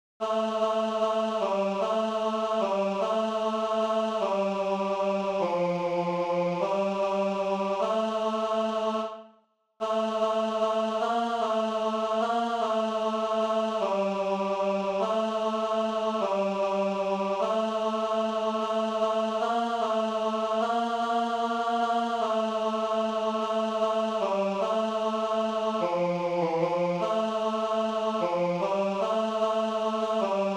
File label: tenor; puer natus l'enfant nous est ne